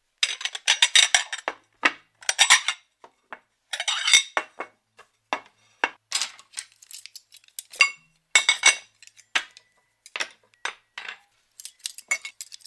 Laying The Table, Placing crockery and cutlery on a table.; Dishware; Household